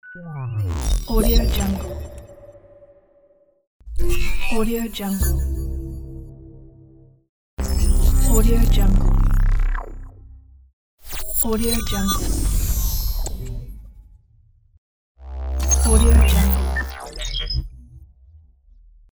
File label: hitech cybernetic device; graysfx